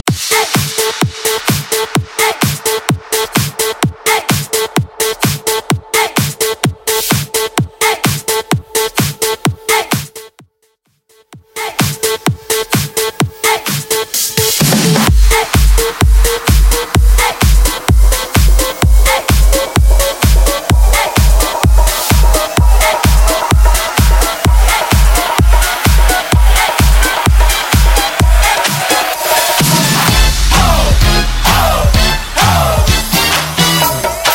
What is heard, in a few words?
80s Mainstream 128 Bpm; Vegas Edit